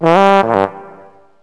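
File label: horn notes; Wired for Sound Pro CD; Aristosoft, Inc.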